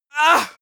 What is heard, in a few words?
male hit; menschen; schreie